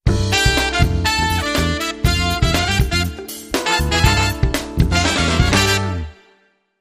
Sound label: Funk Brass, Jingles